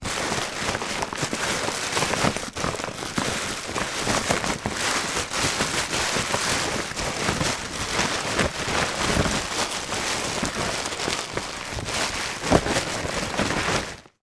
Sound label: foley bag plastic bags inside plastic bag rummage; zapsplat